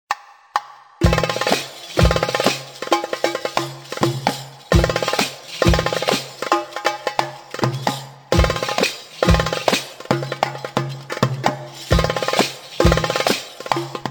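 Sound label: improvisation tabla solo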